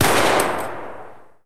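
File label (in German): Pistole 9mm, Waffen Klingeltöne; pistole schrotflinte and maschinengewehr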